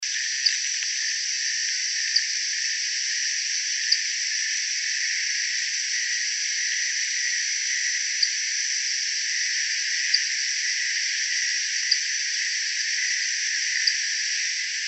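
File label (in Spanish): Ataja tijera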